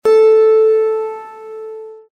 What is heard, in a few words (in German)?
Zithermacher Ton 8 LAUTER